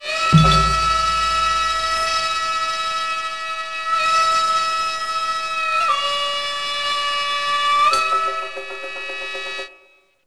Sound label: gagaku